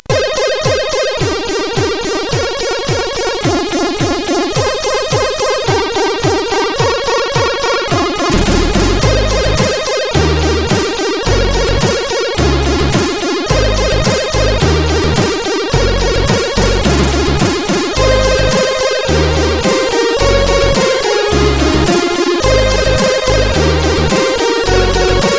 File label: Amiga, CSG8580R5 sid; Sphinx